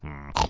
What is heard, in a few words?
piglette oink; Angry Birds Space